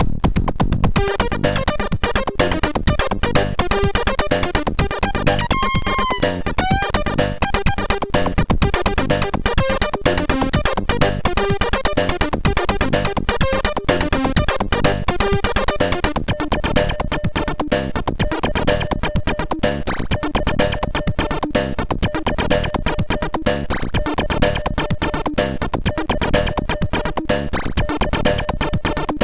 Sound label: sid, MOS6581